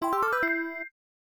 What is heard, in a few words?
Jingle; Chiki Chiki Boys; Sega; Megadrive-Genesis